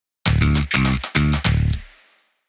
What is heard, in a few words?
funky news flash